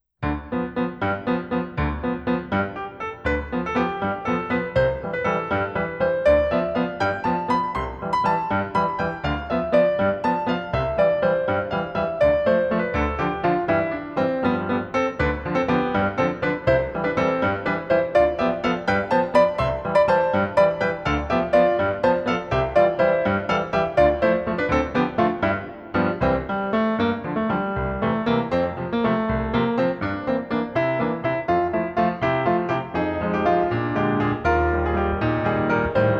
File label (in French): Grands battements en cloche